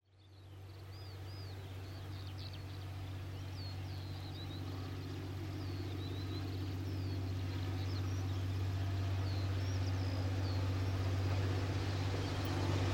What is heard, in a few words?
East of Cass, diesels